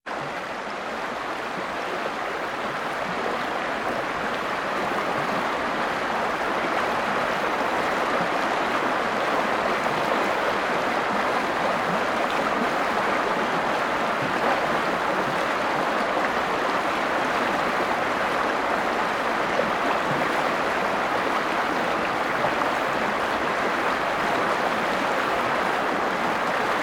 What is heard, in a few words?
River Reflection Off Parabolic Rock